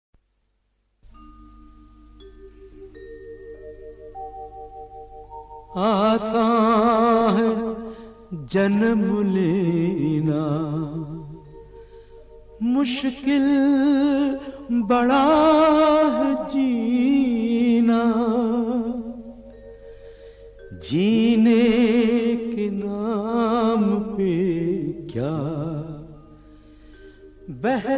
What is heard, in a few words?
Solo; Laalach